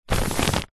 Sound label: paper sheet on soft surface hand rub over crumple rustle; foley